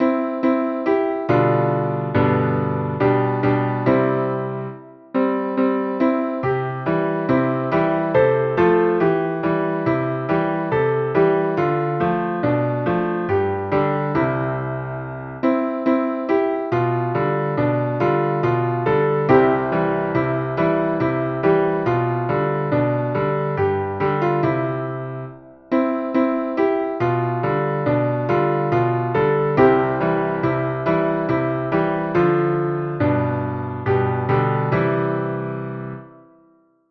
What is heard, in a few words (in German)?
Klavier